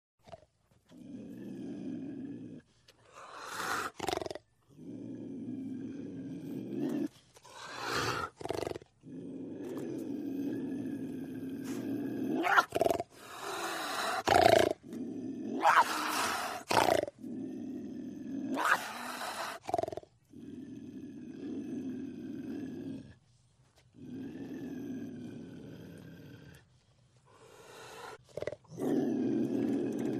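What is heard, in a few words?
COUGAR close up SNARL GROWL; wildlife sounds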